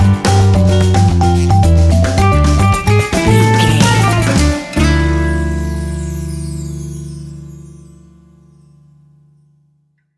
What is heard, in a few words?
fast swing latin jazz music stinger; Fast Swing Latin Jazz Music. An exotic and colorful piece of Espanic and Latin music. Great for Zumba part